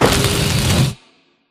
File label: Small Concrete Wall Start; Boomtown; Titanfall 2